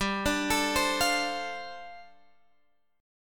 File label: G 7th Suspended 2nd Suspended 4th, G, Guitar, Open G; x,12,12,14,13,15; down